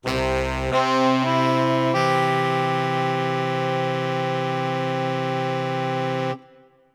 yamaha tyros 5 ensemble woodwind

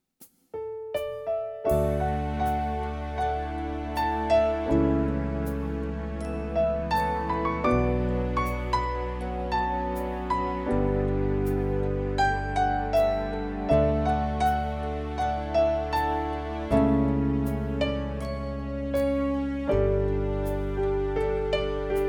style movie ballad; yamaha csp-170

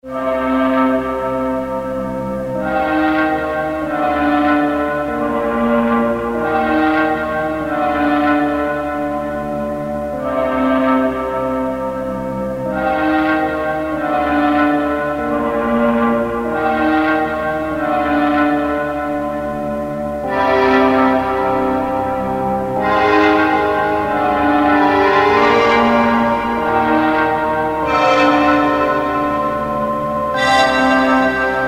arturia v collection 8 emulator ii v resonate

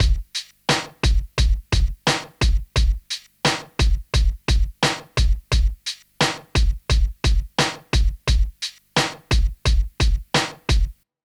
snare kick hh

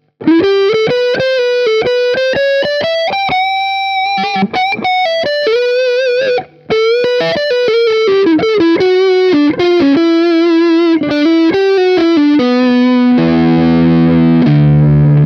lead neck, epiphone sg g-400 pro

